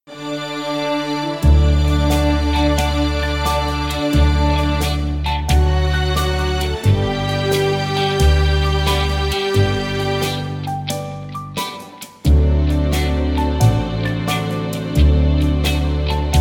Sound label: G No Backing Vocals